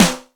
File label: SNR; 90 sSampleCDs; Crypton - DrumBase5000; 60S KITS; NUKE